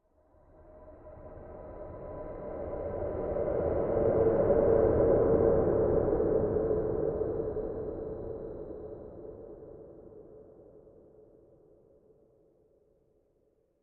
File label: Swell Breath Airy Ethereal; Sound Design; Swells; BigRoomSound